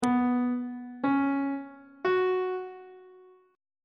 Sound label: c flat suspended 2nd triad chord intervals on treble clef